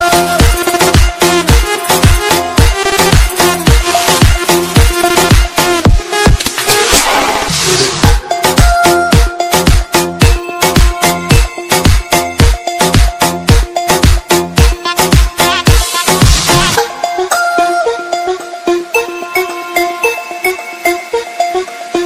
new arabic best ringtone

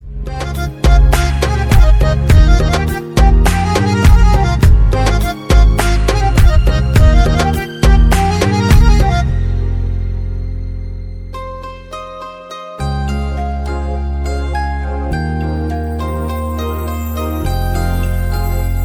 musical ringtone

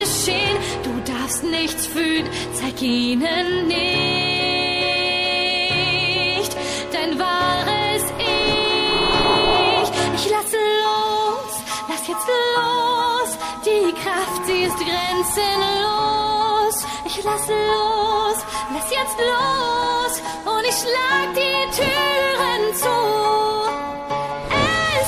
new latest german ringtone

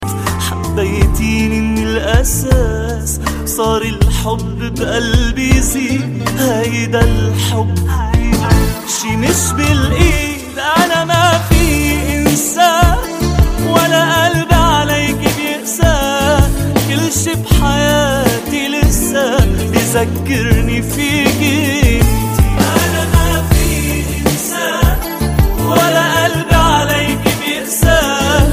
sad love arabic song

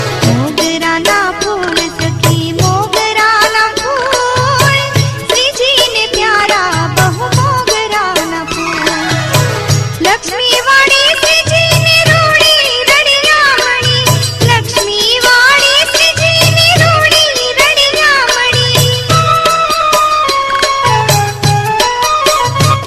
gujarati music ringtone

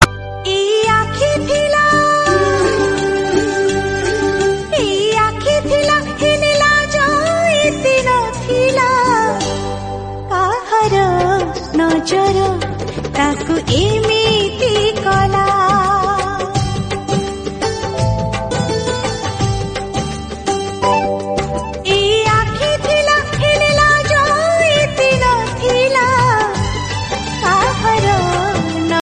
oriya songs

